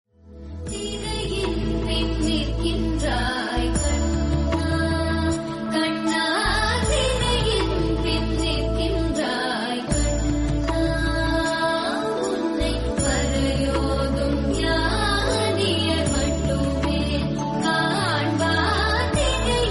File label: south devotional